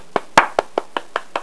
clap

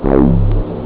HARDCORE; Groovy Bytes: Behind the Moon; SYNTHIS

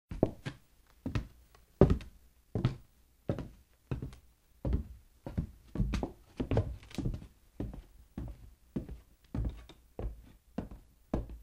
feet; WMBonusXP

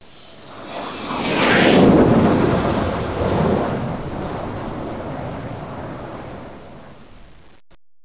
jet, jet; 5thfleet

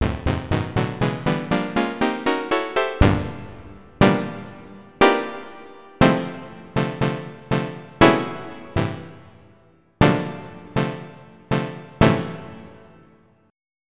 Saw Chord; Onj's Soundfont

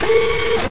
motor

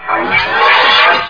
POWER UP; Daikatana; items

